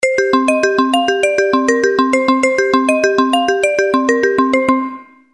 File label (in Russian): Звонок; Motorola MPX200